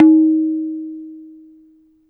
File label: tabla